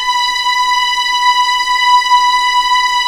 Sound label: B5 EXP MF, VIOLINS; East West; The Ultimate String Collection